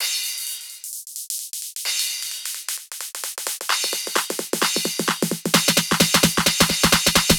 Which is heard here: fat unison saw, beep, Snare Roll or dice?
Snare Roll